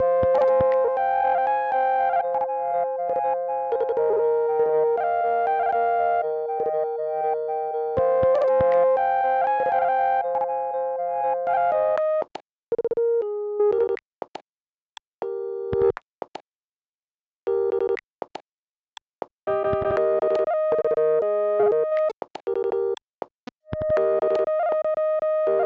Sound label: Synth (Aircheck, Retro)